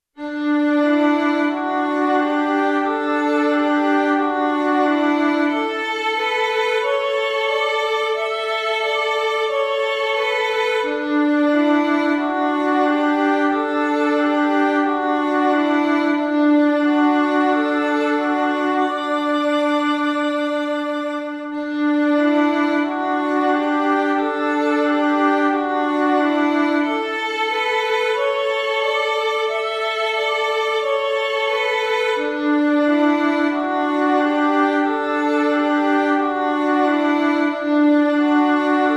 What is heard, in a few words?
Violin Fun